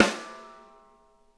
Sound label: SNARE RIM; Sonic Reality; Interactive Drum Kits